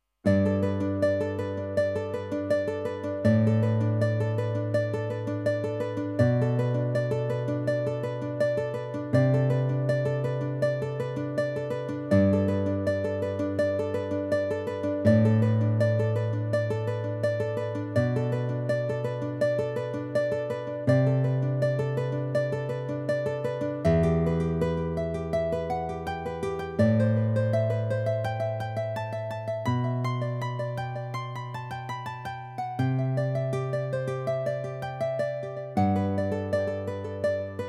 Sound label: Guitar